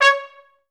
TR STAC C#4; Best Service; Peter Siedlaczek's Advanced Orchestra

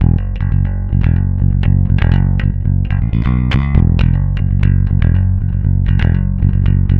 throb F#, 120, bass; Spectrasonics; Bass Legends

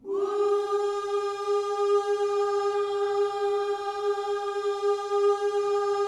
WHOO G#4, gospel; Spectrasonics Vocal Planet

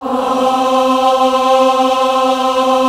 AAH, VOCAL

